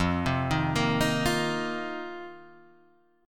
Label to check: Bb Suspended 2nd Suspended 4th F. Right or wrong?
Right